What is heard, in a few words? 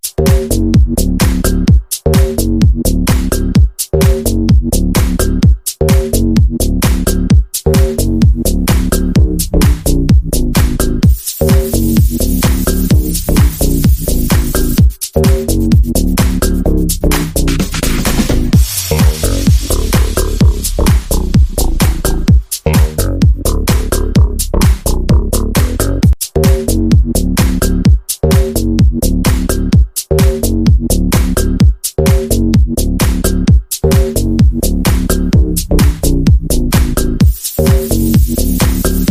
Bounce; color switch mobile ost; Fidget Tap